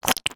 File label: slug or worm squish; sound effects one